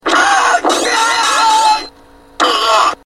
enemy dying voices; golden axe

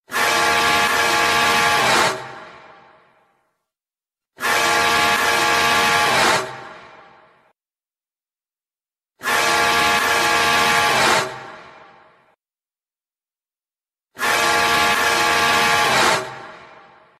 creeper truck horn

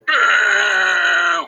booooo

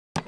click sound effect; baldi

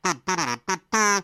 kazoo

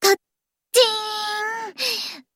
kachink

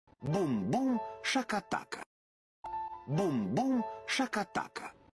boom boom shaka taka

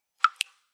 droplet

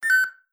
controller turn off; valve index

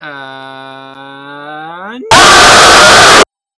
and aaaaaaaaaaaaaaah

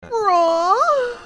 rawr

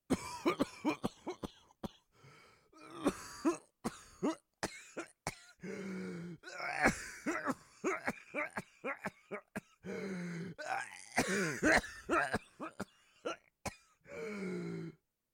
breath caughing

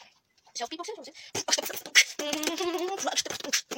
beatbox

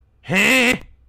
techno back

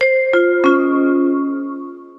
jingle

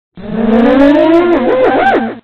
backslide

